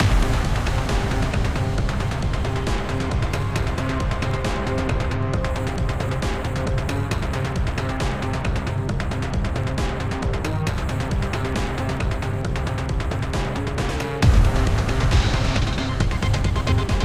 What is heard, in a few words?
Combat Music; XCOM Enemy Within